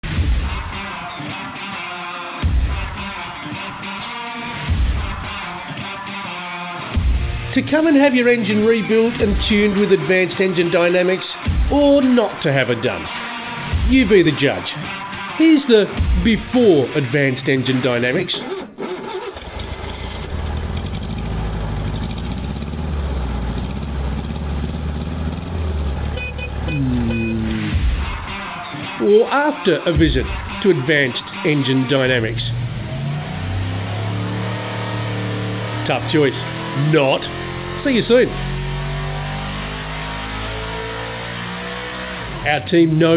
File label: On Hold; Advanced Engine Dynamics